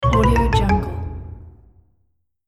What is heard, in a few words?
fail; gamers toolkit